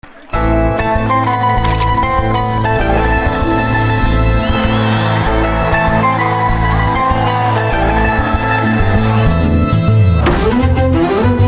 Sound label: Guitar; Thalaivi Trailer; Tamil